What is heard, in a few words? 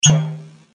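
vacuum pipe out; Danger Mouse - Ultimate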